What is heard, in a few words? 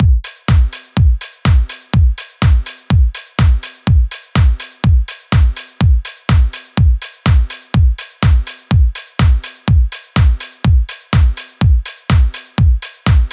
base beat